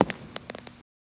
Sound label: FS Male On Hardwood, singles wood